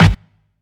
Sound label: low tone acoustic snare sample g sharp key; snare drum samples